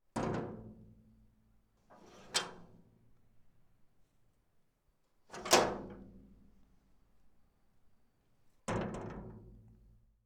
Fence Door Open Close Metal

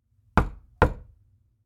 Wood Hand Knock

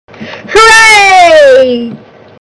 Hurray

